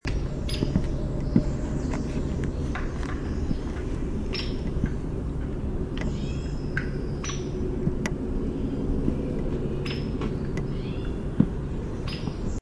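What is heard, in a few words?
peek, hairy woodpecker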